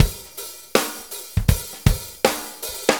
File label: Shuffle Loop, Full Kit Loops; Sonic Foundry (Sony Creative Software); Loops & Samples Drum Tools